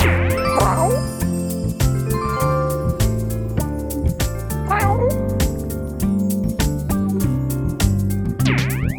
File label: bigwin; Colin The Cat; wazdan